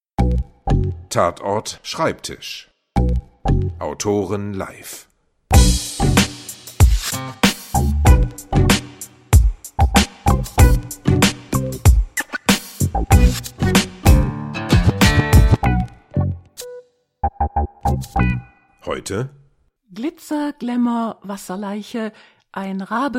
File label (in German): Glitzer Jingle